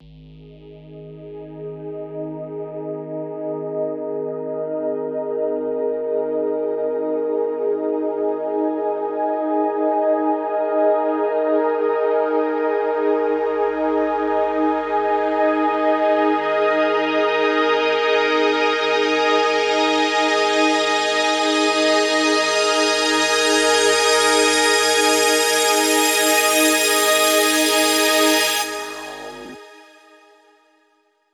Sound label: Intro 130BPM Pad